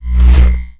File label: energy loud